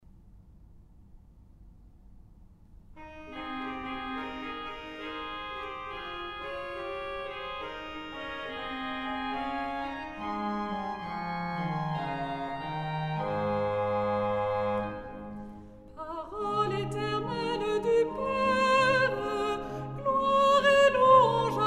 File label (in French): chorale; parole éternelle père